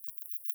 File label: Plecotus auritus